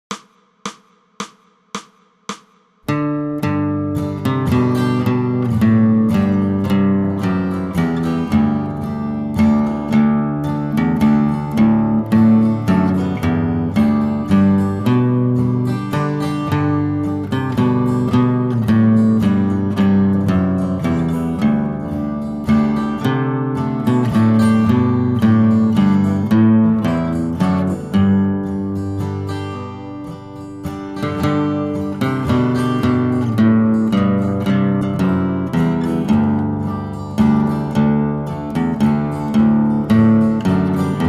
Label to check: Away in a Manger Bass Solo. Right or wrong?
Right